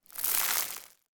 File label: foley debris grit gravel pour fall